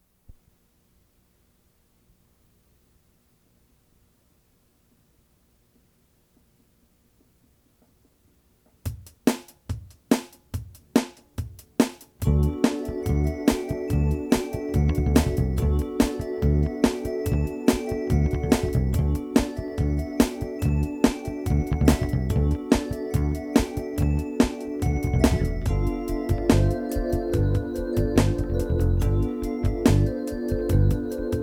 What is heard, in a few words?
FUNK OLDSTYLE